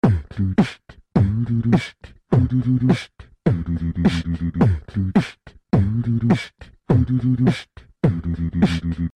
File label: Conch; Key West